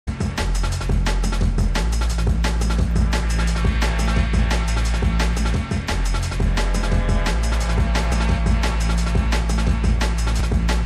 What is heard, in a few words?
Drum and Bass Jungle and Old Skool, UK Bass Radio